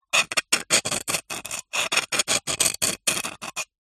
foley glass sharp broken piece scrape scratch another